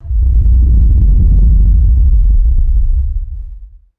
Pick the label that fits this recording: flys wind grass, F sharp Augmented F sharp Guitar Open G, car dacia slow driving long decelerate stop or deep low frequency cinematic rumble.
deep low frequency cinematic rumble